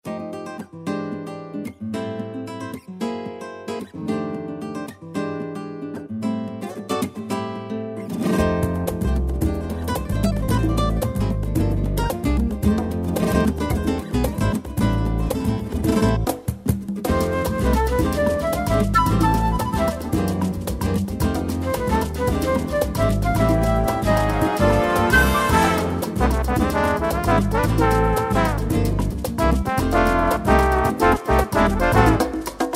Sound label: Acoustic Guitar